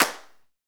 PRC XCLAP, Hand Claps

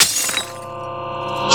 BROKEN; USB Soundscan vol.33; AKAI; Disjointed Textures; BLASTER

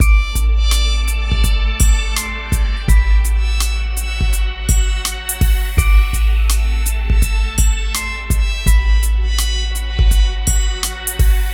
MIX, Hip Hop and R&B Drumloops

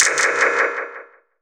Tauk, One Shots Hit; Sonic Foundry (Sony Creative Software) - Future Retro Dance Excursions

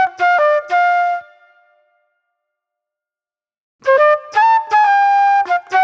Classic Soul Flute

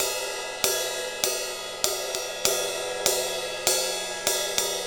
Ride Cymbal Pattern; Percussion; Essential Percussion; Ride Cymbal Patterns

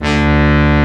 OMNIVERB, ARP Synths, Analog Odyssey; E-MU Formula 4000 Series Vol. 3; ARP Synths II

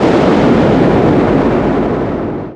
NOISE, Production Effects

